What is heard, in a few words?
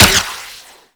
Flare Launch; Archwing